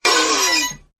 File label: Broken Hinge Hit